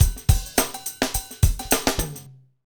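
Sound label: hip hop, Roland drum beats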